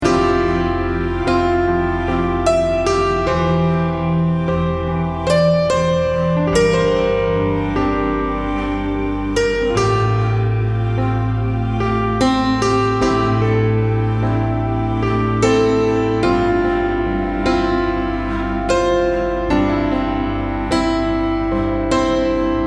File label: Healing Melody